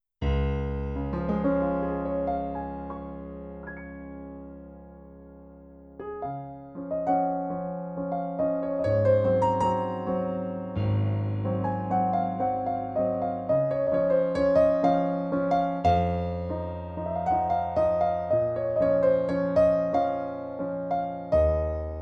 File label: concert grand; roland hp601